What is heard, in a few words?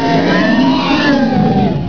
MONSTER, ANIMALS